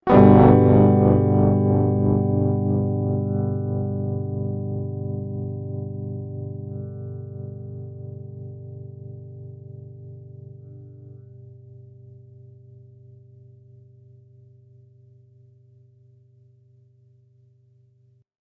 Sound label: Notes R Gm 7 Up; Hylics